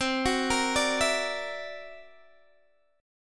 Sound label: C 9th Flat 5th, Guitar DADGAD, chord